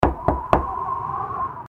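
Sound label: knock on the door and howling wind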